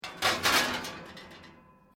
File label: OVEN TRAY SMALL PLACE; Common oven sounds; Appliances (Sound Effects:Household)